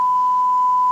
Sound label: censored beep